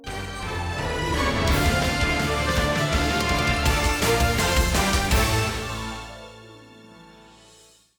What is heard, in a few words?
Music Chest Stinger; Zak Storm Super Pirate; 2017 mobile